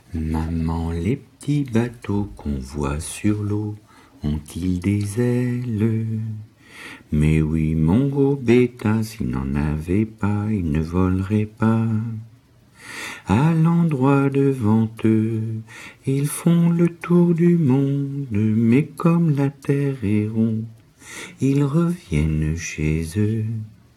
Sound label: bateau